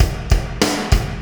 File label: keyser, Drum loops Boombap